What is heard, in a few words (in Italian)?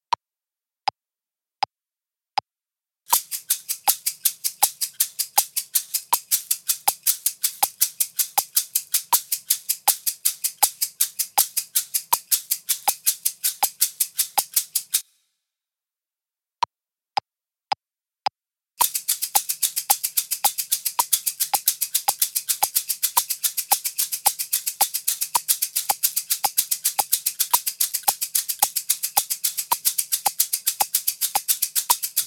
Con due shakers